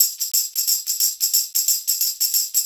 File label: 90 PERC; Best Service Advanced Media Trax Modern Composer